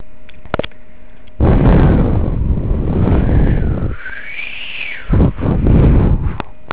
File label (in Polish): wiatr